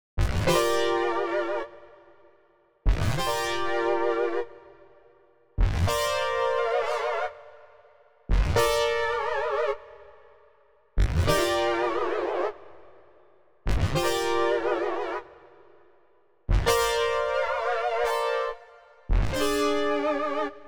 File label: synth, Gmin, 089; Big Fish Audio; Legendary; How Can I